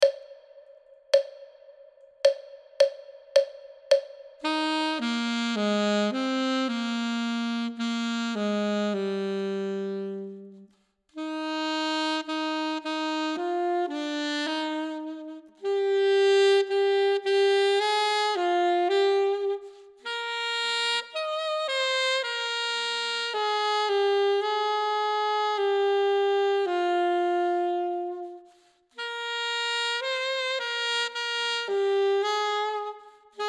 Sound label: duet part 1; Ten Easy Duets